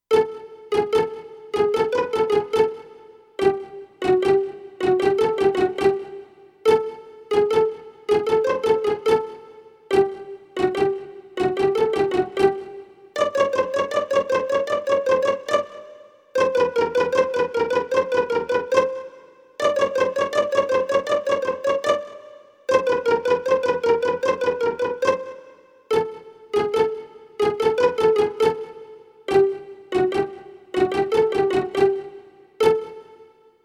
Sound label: Game Music Concept